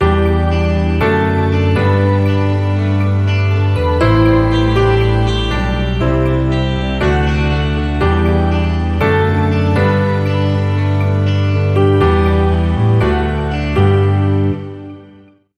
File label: Forever, No Drums